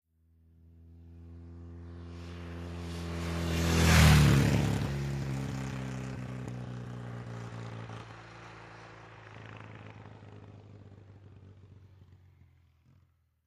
Motorcycle, Norton, Accelerates In And Fast By ( 50 Mph ), Back Off Throttle On By.